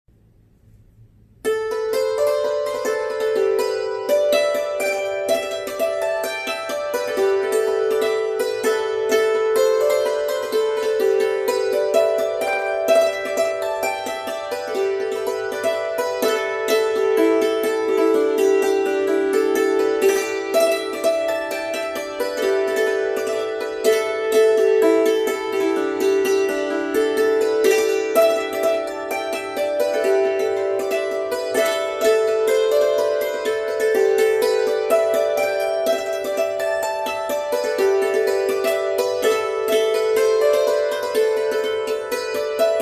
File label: polka on the hammered dulcimer